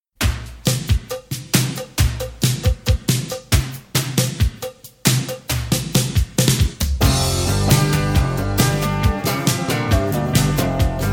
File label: Rockin' Guitars; heavy